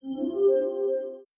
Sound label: right answer effect